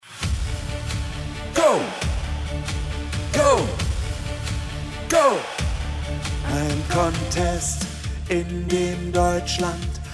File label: Bass